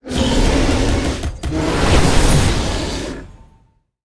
Boss Gorilla, monster

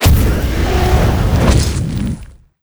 wind draco deth

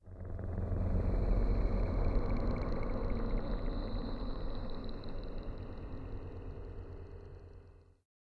cemetery, snow

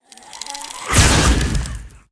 plant troops attack, monster

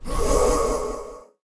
phantom warrior damage, monster

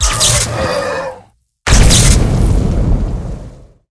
mech zard dead, monster

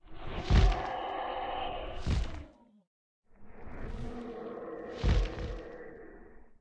harpy warrior idle

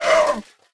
dark phalanx damage, monster

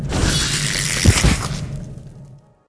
death general dead

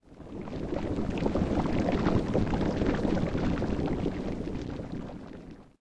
lava; portlux

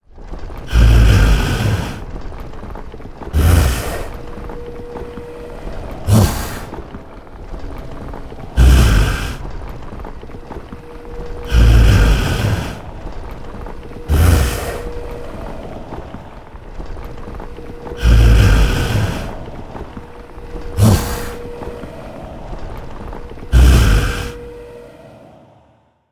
boss main, monster

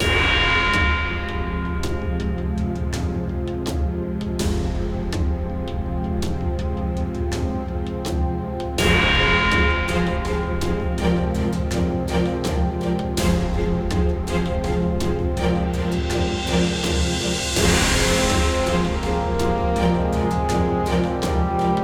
Dungeon Theme, bgm

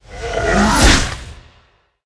orc attack, monster

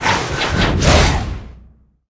mummy blader attack, monster

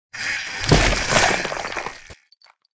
ant death, monster

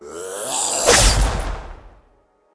gast attack

